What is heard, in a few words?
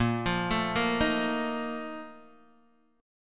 Bb Major 7th Suspended 4th, Guitar, DADGAD